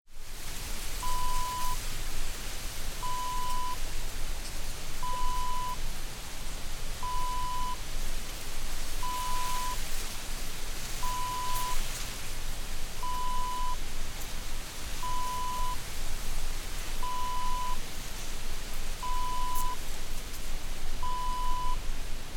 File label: breeze lake rushes